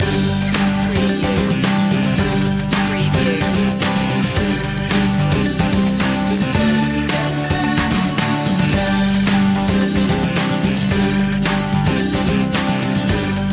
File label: shopping centre loop